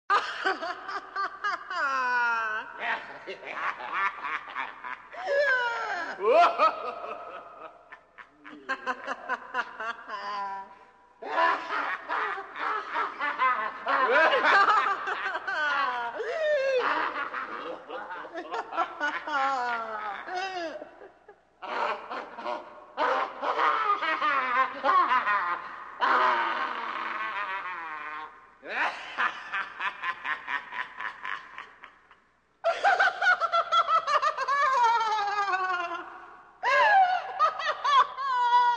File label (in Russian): Smeh idiotski; Звуки сумасшедшего; Звуки сумасшедшего, именно те идиотские звуки, которые издают психи в фильмах страшилках